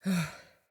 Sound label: Exertion; Deora; Garren Eruption